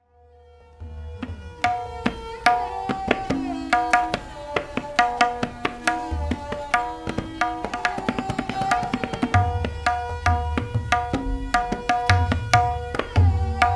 Chalan, tabla; 1964

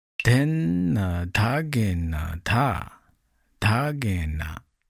Dhin na Dhagina Dha Dhagina, tabla

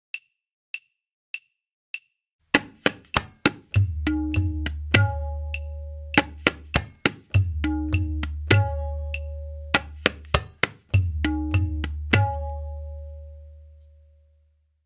Tihai Damdar Click

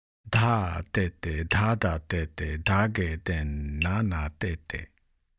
Dha tete Dha dha tete, tabla

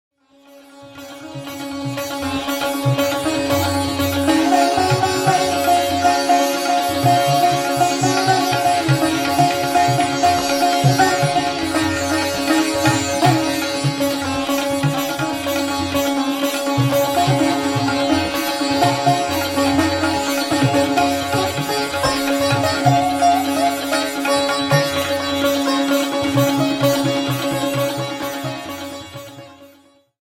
Dhun Drut Teental; Alla Rakha

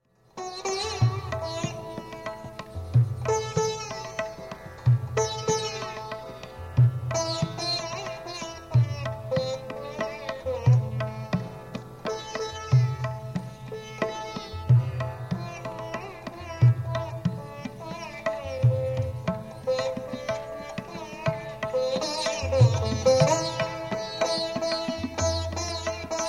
Bhairavi Dadra into 4/4 Solo, tabla

